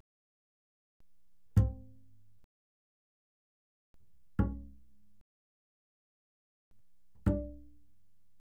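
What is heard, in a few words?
Bass pizzicato fortissimo sul D C4 D4, doublebass; Strings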